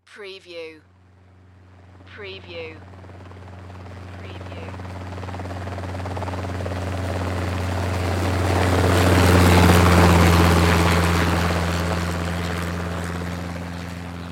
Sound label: Helicopter Pass By